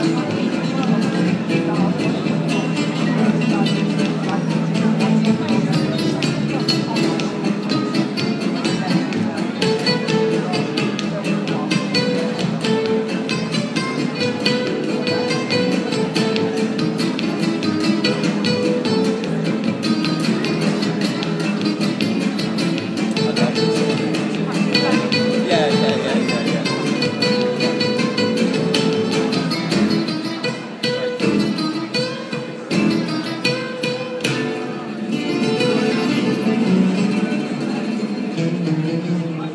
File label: trio manouche in the national theatre foyer